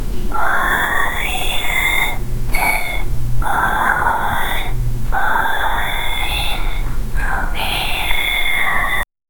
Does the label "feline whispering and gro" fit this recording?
Yes